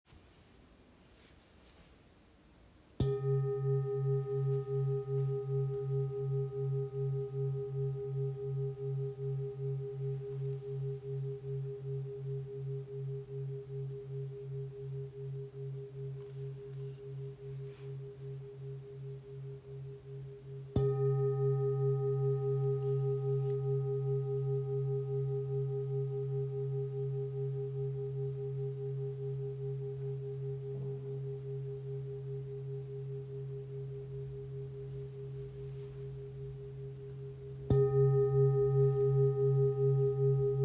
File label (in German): Klang